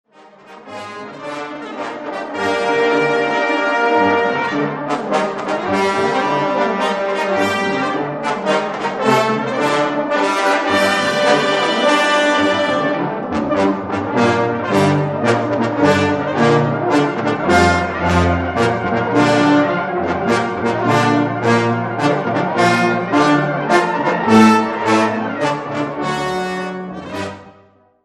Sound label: ride